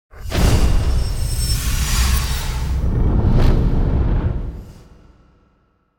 Stinger Impact Into Whoosh Heavy Trailer Beginning; Hollywood Edge; sound designer's toolkit v2